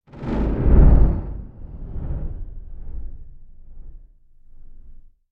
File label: Thunder Whoosh Low; Hollywood Edge; sound designer's toolkit v2